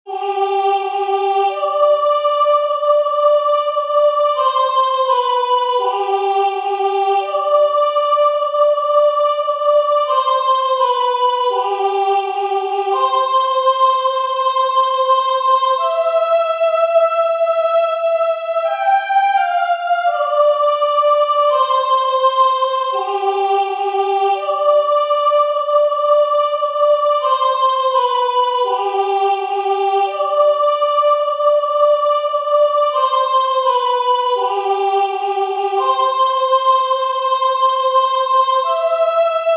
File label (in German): Chor Sop; W-O VI. Weihnachtsjubel Nr. 3